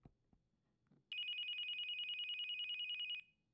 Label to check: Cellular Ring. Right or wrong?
Right